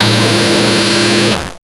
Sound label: damaging big machine